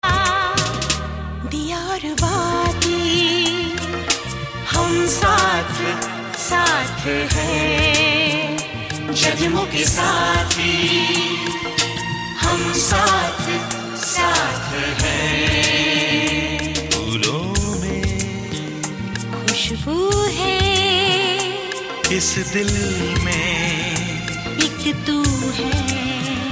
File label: Most Romantic Ever